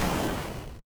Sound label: retro game explosion